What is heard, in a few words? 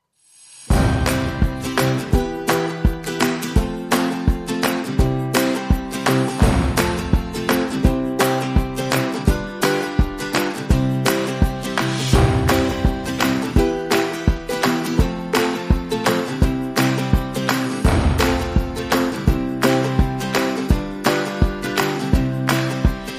Drums Guitars and Ukulele Rhythm Only